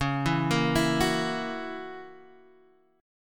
F sharp 7th, guitar

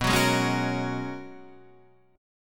G sharp Minor 11th B, guitar chord

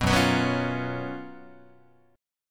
Gb Major 7th Suspended 4th Gb Guitar; GbM7sus4; strummed; 2,2,2,4,5,4; JGuitar

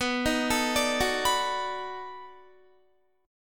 B 7th Sharp 9th B, Guitar; arpeggiated

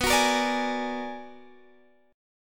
B-Minor Major 13th B down Guitar Half Step Down; BmM13

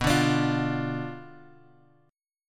B Diminished B, guitar; Bdim Chord {X 2 0 X 3 1} strummed

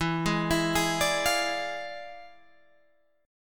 E 7th Flat 9th Guitar Open G, chord

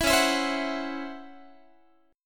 Db Minor Major 7th Double Flat 5th Db down Guitar; DbmM7bb5 strummed

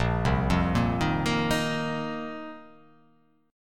A sharp Suspended 2nd Suspended 4th A sharp

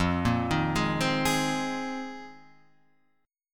C Minor 7th Sharp 5th, F, chord; arpeggiated; JGuitar

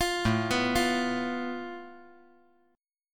A Suspended 2nd Sharp 5th

